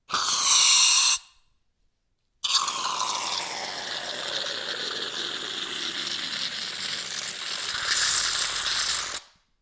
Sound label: cream; خامه فرم گرفته